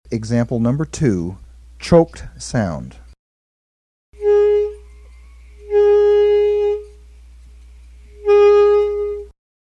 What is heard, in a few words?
Emb SAX; EmbouSure; saxophone